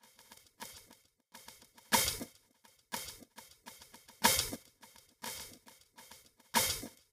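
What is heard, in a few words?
drum, separated component 3; Separation of Sound Sources by Convolutive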